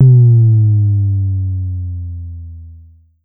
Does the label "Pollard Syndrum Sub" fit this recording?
Yes